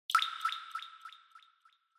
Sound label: Water Drop High Delay